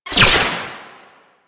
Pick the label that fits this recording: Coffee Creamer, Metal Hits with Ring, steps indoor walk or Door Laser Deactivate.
Door Laser Deactivate